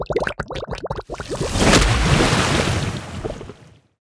princess mud pool transformation

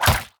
enemy tentacle attack; sfx; acolyte

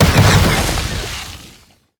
rage outburst death; heroes kratoa

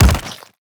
enemy ash spirit melee

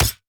tower dune Sentinels basic Attack

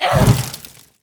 witch death

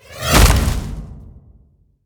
hammer; malik; concaida

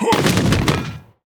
enemy darksteel fist death; sfx

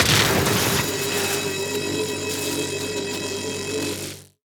tower eldrictch Channelers basic Attack long

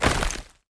winter Age release

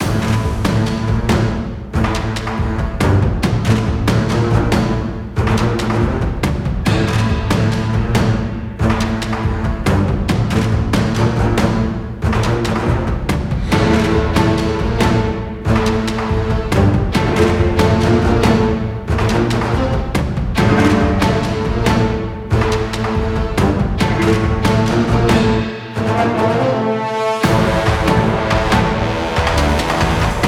bgmusic battle

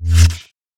time cast end; highmage

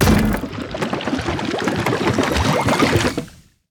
tower brew Master bad Batch rattle

